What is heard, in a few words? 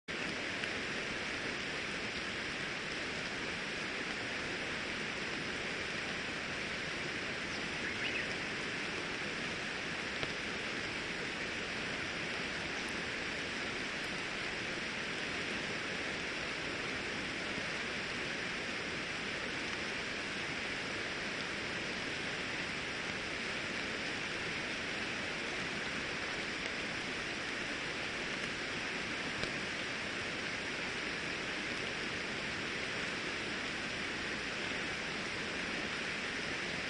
riverside; Kyoto U; Ashiu